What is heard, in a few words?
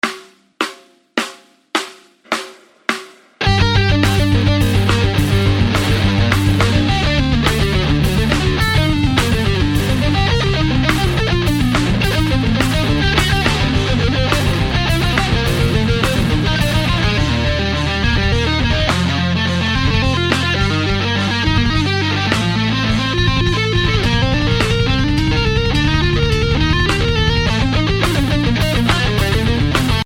Presto in G Minor